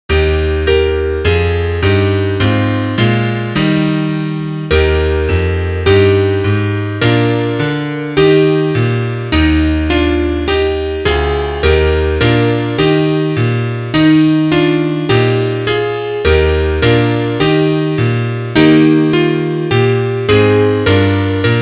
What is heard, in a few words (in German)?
Klavier; martin war ein frommer mann